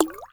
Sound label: Water Drip; Liquid; 1001 Sound Effects